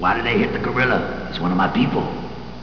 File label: wolfman